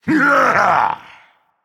grunt attack, dragon; fafnir

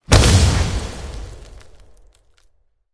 defeat reaction hit ground; smite; odin

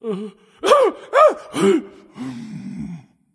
surprised; smite; kumbhakarna

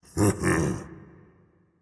heh heh, voice; Smite; ymir skin6

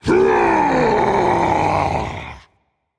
battlecry rage; chaac

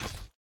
foot; smite; ahpuch skin10

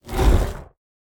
swing; smite; geb

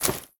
armor; smite; tiamat

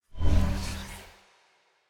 Idle Magic; smite; izanami

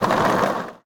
skateboard land roll; Smite; Sun Wukong

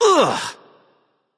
ugh; smite; loki

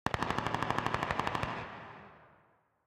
landing gun auto; smite; Ares

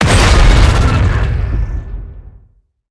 hit; smite; heimdallr

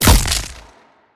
hit; smite; arthur skin9

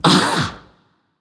notice; smite; voicepack; medusa

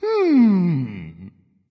thinking; smite; ahpuch

